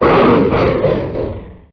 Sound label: Cry